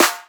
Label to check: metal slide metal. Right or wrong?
Wrong